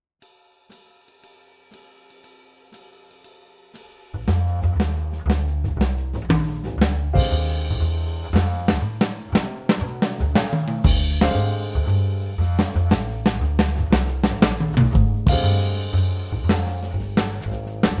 Swing, Drum Fills; Essential Drum Fills (Erskine)

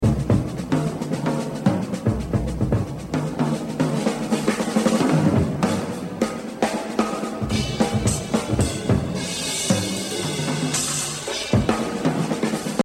Exercise, jazz drumming

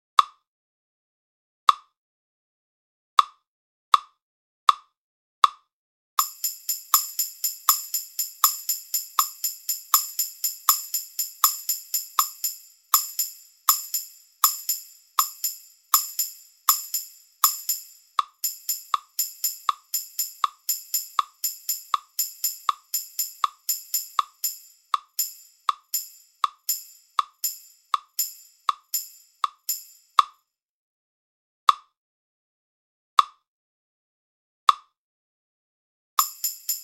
TRIOLETS, Batterie Reggae; Buchmann; Batterie Reggae VIbrations

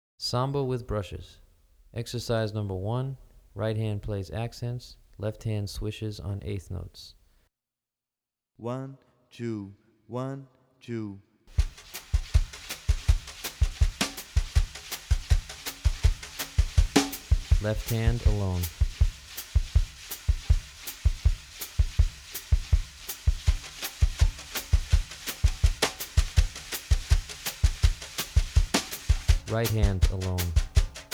Samba with Brushes; Brazilian Rhythm For Drumset; DaFonseca, Weiner

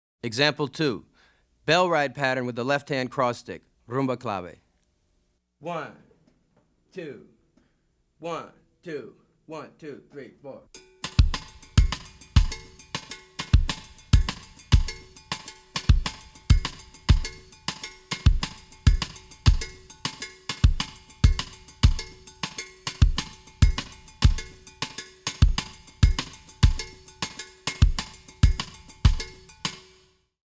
Afro-Cuban Grooves For Bass And Drums

